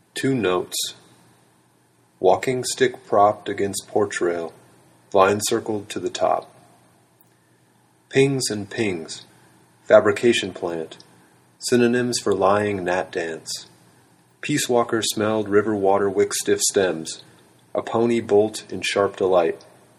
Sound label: two notes